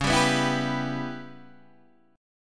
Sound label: C sharp Minor 7th Flat 5th C sharp Guitar DADGAD